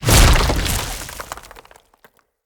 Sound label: Wrath Head Spike Spawn; Bromius; Abilities